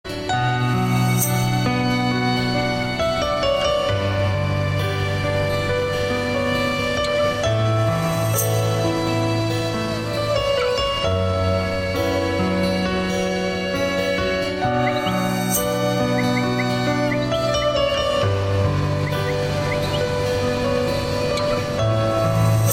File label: chillout sequence; ringtones